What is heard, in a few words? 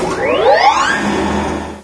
beam rifle charge; XDM